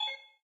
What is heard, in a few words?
Menu Click; gamerip; bejeweled 2 deluxe